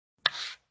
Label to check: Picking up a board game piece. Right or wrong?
Right